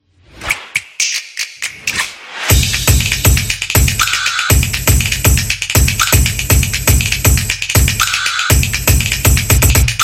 Fun Energetic

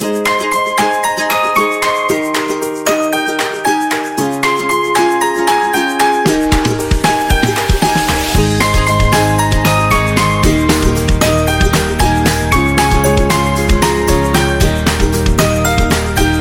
Fairytale; cooking show

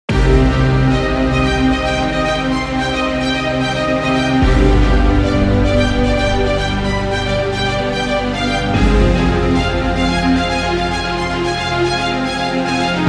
Tragedy

